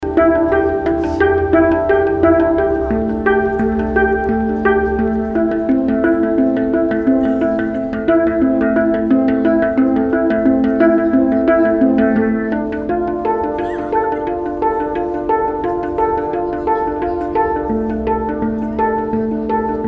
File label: spacedrum; utcazenesz; urdob; deak ter; kondulo templomora